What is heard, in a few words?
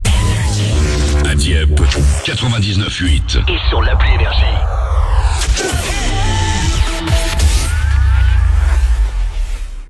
JINGLE